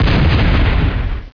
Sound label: CANNON